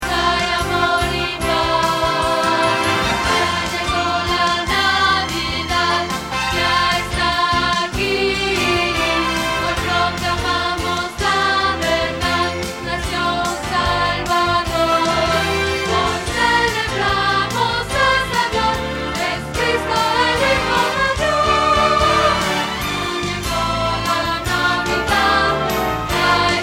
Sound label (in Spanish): Contralto